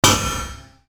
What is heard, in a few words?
gui duplicate